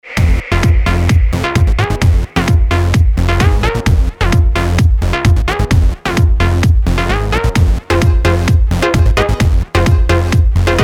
Function Loops

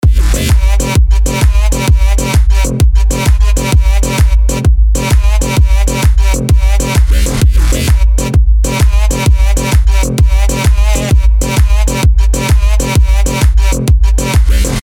melbourne bounce; FL STUDIO PROJECT

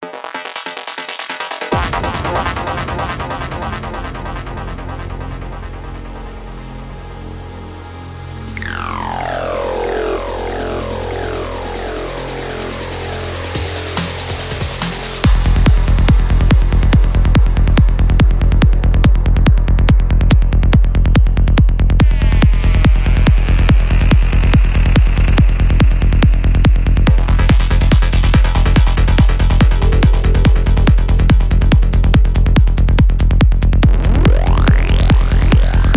psy trance soundbank for sylenth1

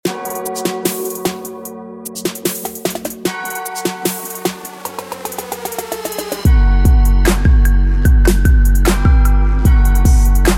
trap drums; PREMIER

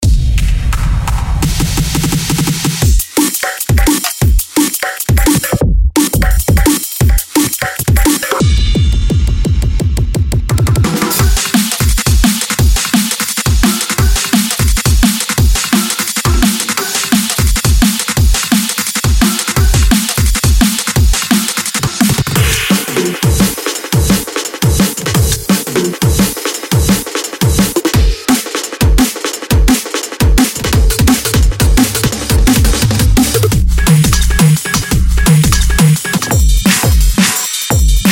DnB drum kit

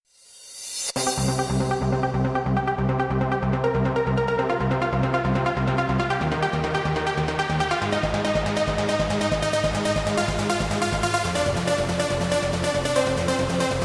Trance MIDI

